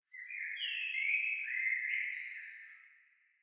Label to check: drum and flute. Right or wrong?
Wrong